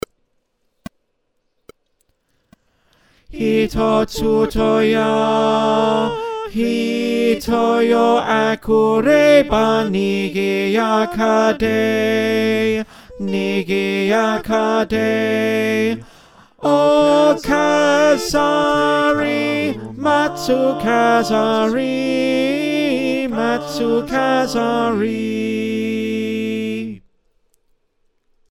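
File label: tenor, choir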